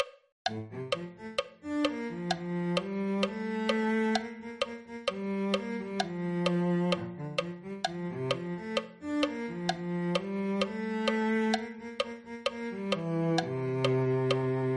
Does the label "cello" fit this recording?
Yes